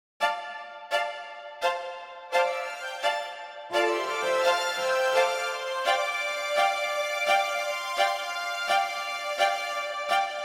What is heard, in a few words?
Strings Yamaha Rev7 Strings 3secs